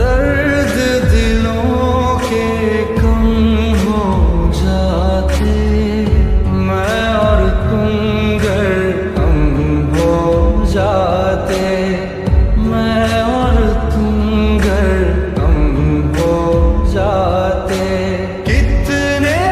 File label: lofi ringtone